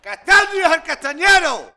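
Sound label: rhythmic vocal; Best Service; World Colours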